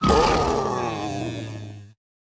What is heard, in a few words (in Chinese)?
嗅探兽死亡声